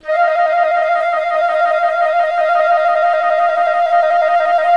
FL TLMI D#4; Best Service; Peter Siedlaczek's Advanced Orchestra

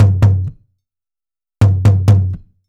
dundun; ILIO - Ethno Techno

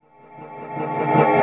SWELL STOP, Trance Fusion; ILIO; STOP SHORT

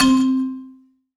C3, Synth Line; 90 s Sample CDs; NiceBeats; SynthLine VOL-15

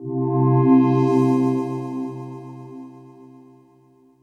Ambient Pad, Drum & Bass Melodic Synth

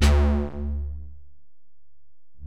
Bell, Alesis DM-PRO

